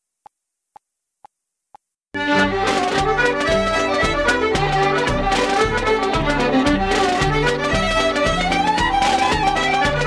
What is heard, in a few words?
Irish Ceili Reels Medley; backing track